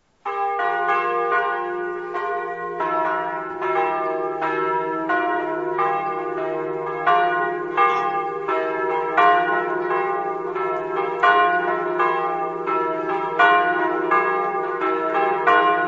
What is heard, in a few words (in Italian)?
torviscosa, campane